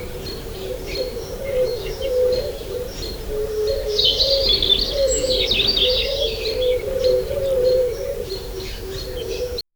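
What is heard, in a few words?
natural rural morning ambience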